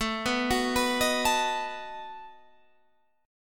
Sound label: A 6th Suspended 2nd Sharp 11th, guitar